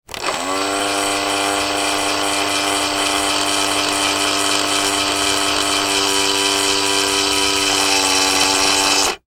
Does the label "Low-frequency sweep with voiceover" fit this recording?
No